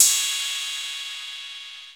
HAT OPEN; Rubber Chicken Software Sample Bank; DRUMS + PERC

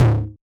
Yamaha DJX 2B, Hard Tom

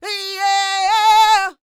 D YEAH, Vocal R&B Dance; DB DANCE

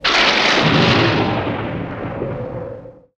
Cartoon Thunder; musicradar; cartoon caper

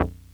bass stabs, Drum Machines FutureRetro FR777

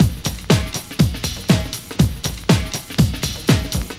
120, LOOP, Roland; Sound & Vision - Gigapack I

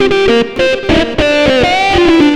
xtra lead, Leads; Sonic Foundry (Sony Creative Software); Ugly Remnants VOL-2

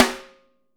wood; Sampleheads; Peter Erskine Living Drums

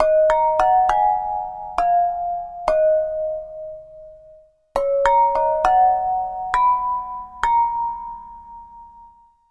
Flower Pot

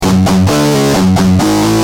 G Jam, Guitar; Industrial

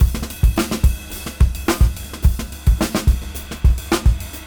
Junky Funk 107 BPM

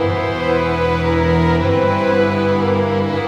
Cinematic Strings, Orchestral; Sonic Foundry (Sony Creative Software); Orchestral Series VOL-3; Cinematic 17

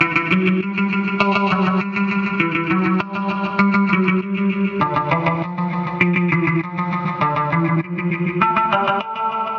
E Gtr Miami; Zero-G; Future Wave

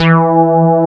BASS, Tekno Industrial; East West

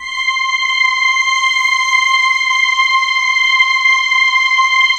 Dream Voice Pad, C; E-MU Producer Series Vol. 5 – 3-D Audio Collection; 3DSynths; 3DreamVoicePad

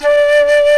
GUITAR FLUTE

